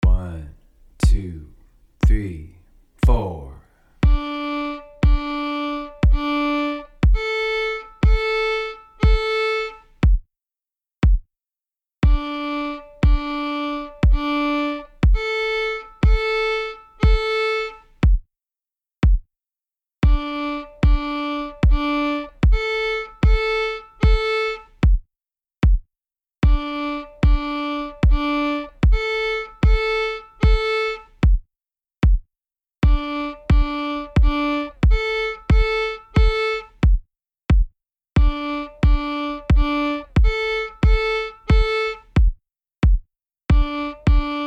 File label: string crossing triplets D to A, fiddle